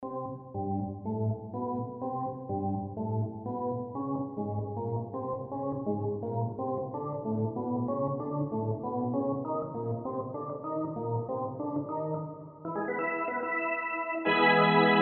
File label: arena organ charge